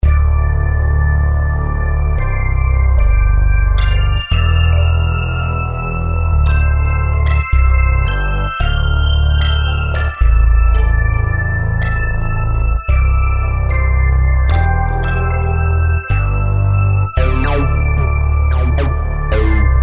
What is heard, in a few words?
instrumental